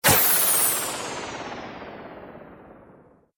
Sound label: Magic Torch Fire Spell; Magic Sound Effects